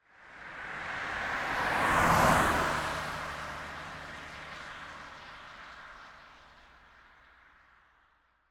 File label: wet asphalt medium speed passby long, Cars In Motion; stereo; D100; Soundholder